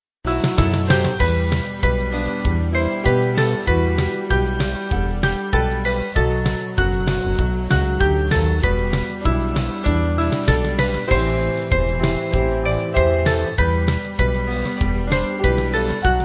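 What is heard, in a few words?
Slow Band, Music Backing Files for Churches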